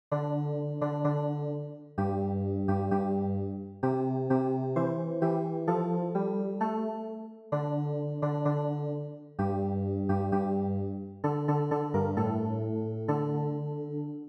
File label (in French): CLAVIER